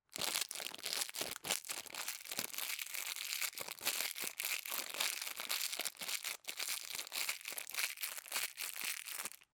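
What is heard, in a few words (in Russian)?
звук шелеста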